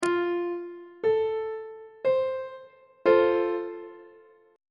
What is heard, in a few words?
f major chord; on treble clef